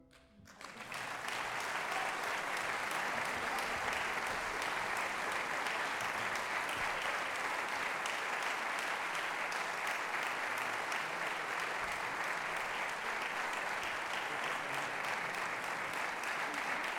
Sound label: Ambience Stereo Audience Applause Concert Hall Barcelona